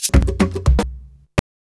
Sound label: SHAKER, Modern Percussion Loops; Soundscan vol.56; AKAI; SHAKER133